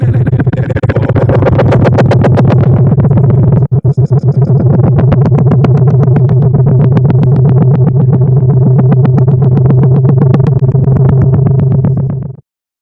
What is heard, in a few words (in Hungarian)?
Variacio modular